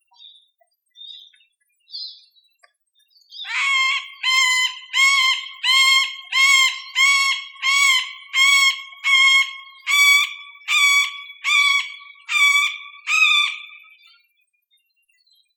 dzieciol sredn glos terytorialny samca

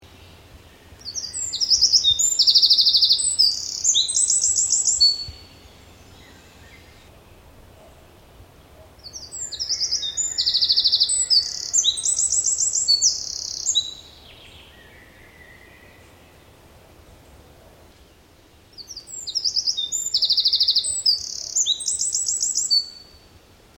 strzyżyk śpiew samca

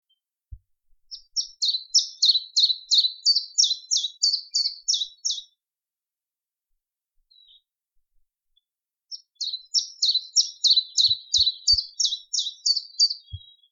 pierwiosnek śpiewający samiec

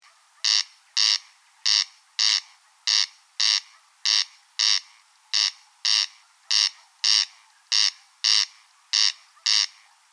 derkacz śpiew samca

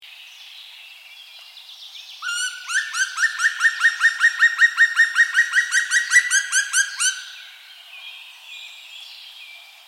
dzieciol czarny glos terytorialny samca

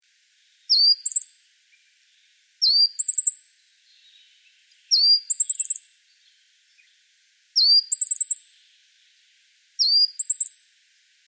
sosnowka glos kontaktowy

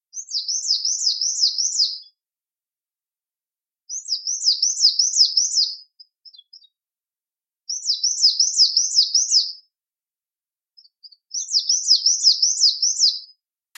sosnowka spiew samca

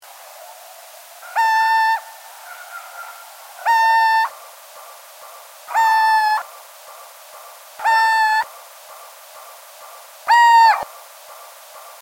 pojdzka spiew samca

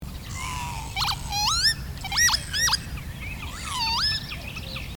czajka tokujaca

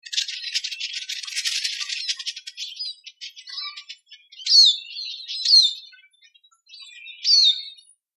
czyz glosy stada

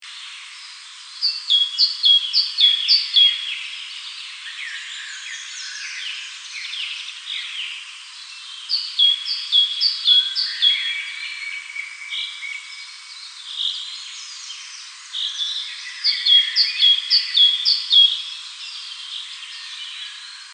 bogatka śpiew samca

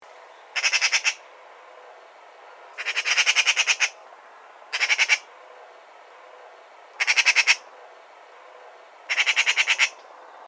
sroka

